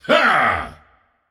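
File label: non verbal yay, voice; cernunnos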